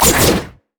rampage; LoL; hecarim

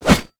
snap trap; LoL; caitlyn

